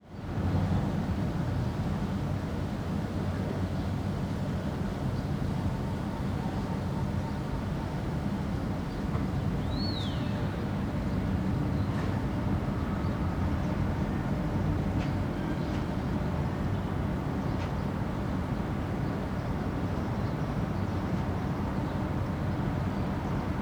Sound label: AMBIENCE CITY SPAIN BARCELONA EXT EARLY MORNING DAY BACK ALLEY; Monte Sound; Barcelona City Ambiences